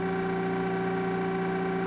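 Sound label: ramp hydraulic loop; XBOX; Star Wars Obi-Wan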